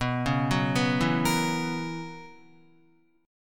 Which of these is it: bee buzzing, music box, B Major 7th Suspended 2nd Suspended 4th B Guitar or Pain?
B Major 7th Suspended 2nd Suspended 4th B Guitar